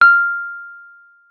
WUFF F6, WURLITZER; Xsample VOL-1

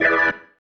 Stab One Shots, Organ

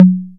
Low Conga; EMU Xtreme-Lead-1

